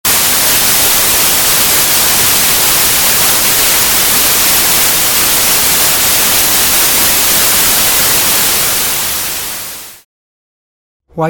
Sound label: noise